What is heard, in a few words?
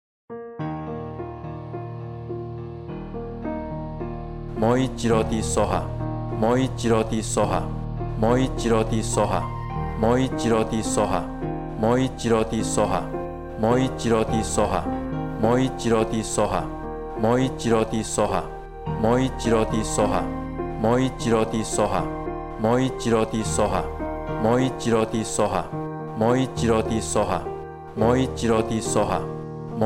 music